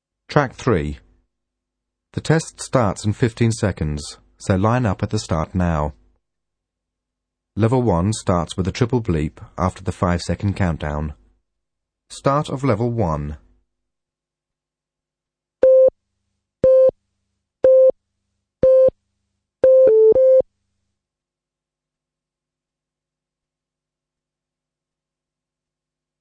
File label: beep test uk; training